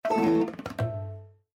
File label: Raga Critical Stop, windows 7